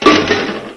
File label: pick